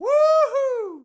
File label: woohoo; Temple Run